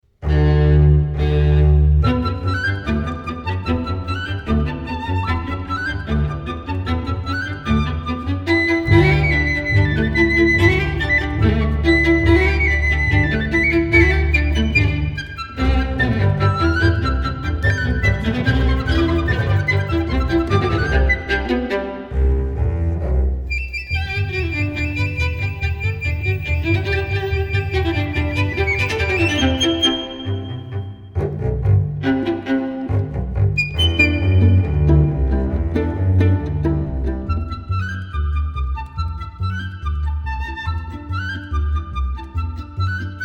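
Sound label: Schulhoff Concertina 4th movement; Schulhoff - Concertino for flute, viola, bass; Festival Montalcino 2009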